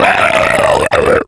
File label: Crawler Infected; zombie plague